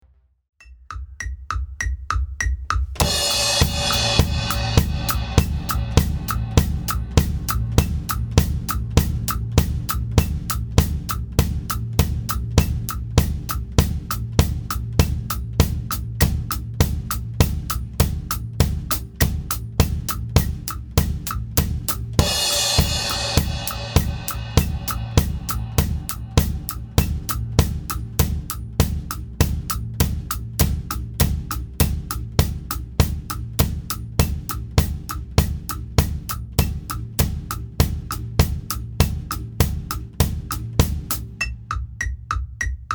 Drum

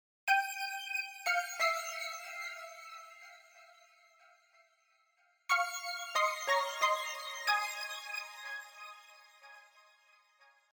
Synth

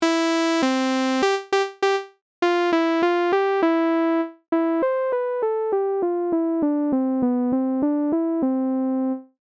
Low Pass Filter

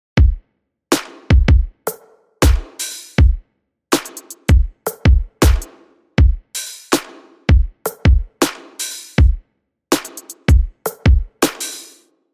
Drum

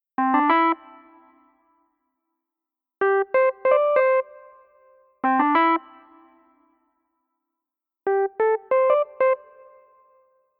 E. Piano

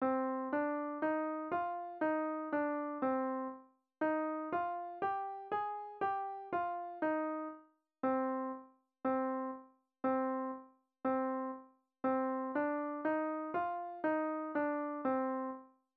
C minor, piano

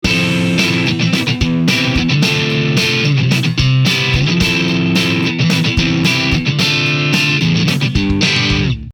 drummer; guitar

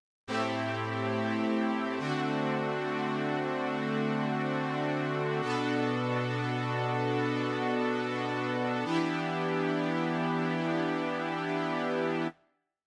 Synth Pad

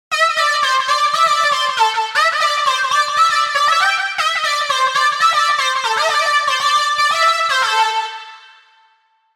Synth Lead

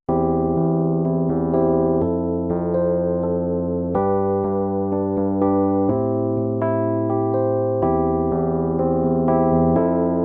EP dry

